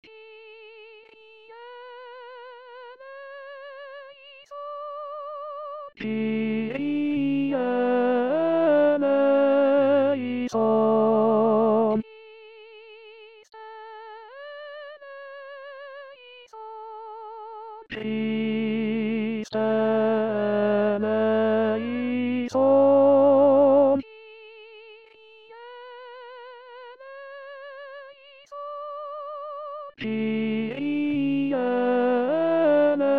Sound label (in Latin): Kyrie Tenori; Decha